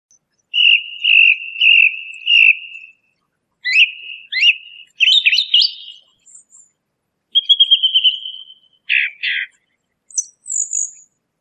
Tordo bottaccio

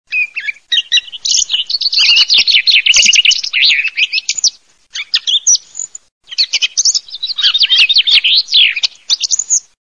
TORDO FRINGUELLO

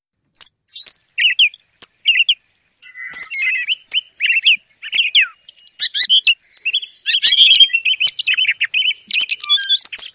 tordo

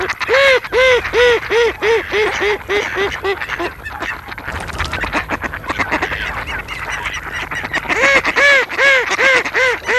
GERMANO

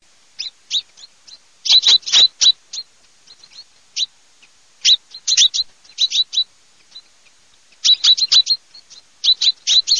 FRINGUELLO, birdsound

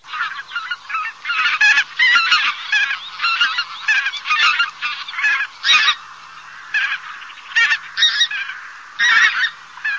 OCHE, birdsound

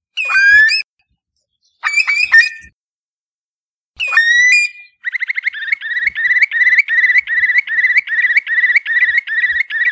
CHIURLO

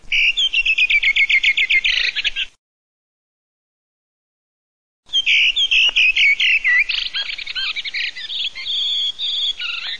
SASSELLO

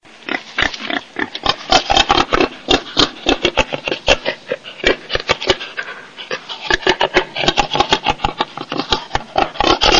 CINGHIALE

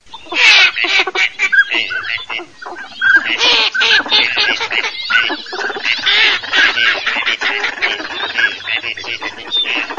MESTOLONE CANAP CODONE

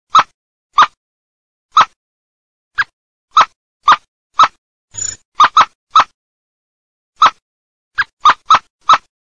MERLO BIANCO, birdsound

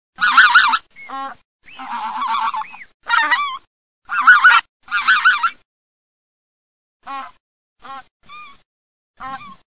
oca lombardella

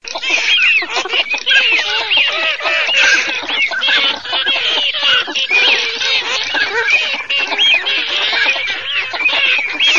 FISCHIO COD ALZ MARZ MEST

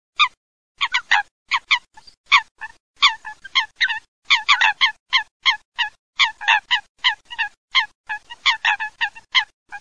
folaga

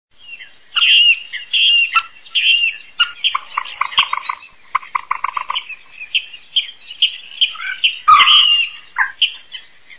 GALLINELLA PORCIGLIONE

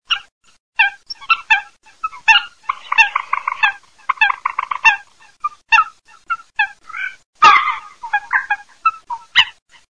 folagallinella, birdsound

